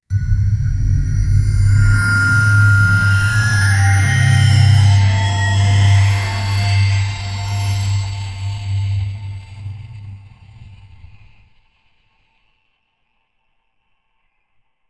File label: holy spell full of magic